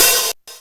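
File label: hihat open, drum machines, LM-2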